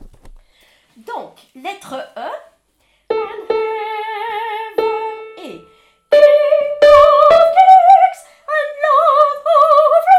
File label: Tenor; Hallelujah Handel; Ensemble Vocal Chanteval